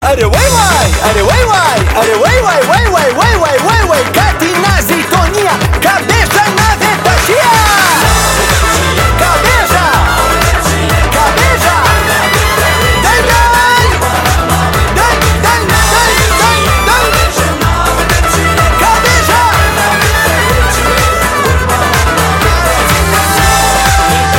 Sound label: Jazz